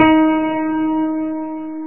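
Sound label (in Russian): пианино одиночный яркий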